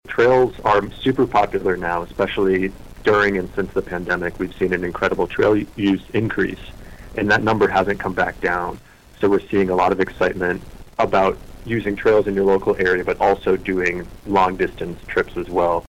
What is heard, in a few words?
bike